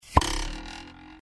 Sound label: twang; disney; mashup